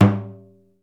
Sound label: deff mute; Deff Bendir &Req